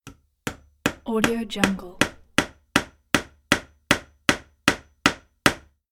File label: hammer hammering nail; soundjay